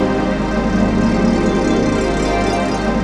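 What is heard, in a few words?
chord pad, Pads; Best Service; XX Large - Pads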